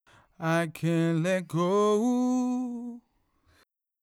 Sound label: Backing Vox